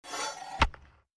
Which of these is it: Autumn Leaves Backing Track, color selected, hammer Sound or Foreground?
hammer Sound